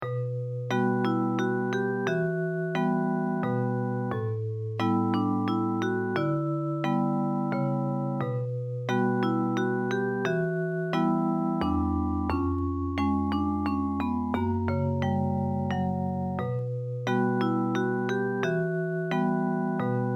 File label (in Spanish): melodia nocturna